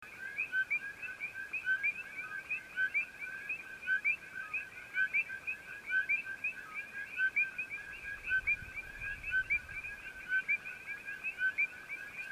eleutherodactylus portoricensis el yunque national forest puerto rico; male; north-eastern Puerto Rico; Choruses of this species are only heard in some of the mesic forests of / Puerto Rico.; West Indian